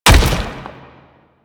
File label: Step; Warframe; Sentients; Erra; Foley